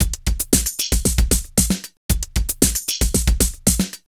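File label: drum loops, right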